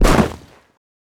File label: land snow, footsteps; player